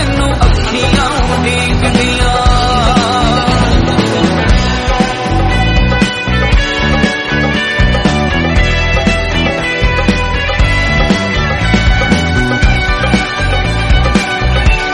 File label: BGM Instrumental